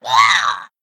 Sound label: Female Kobold Death